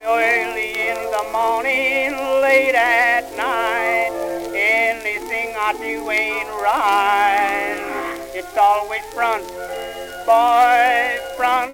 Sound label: Bell hop blues, jukebox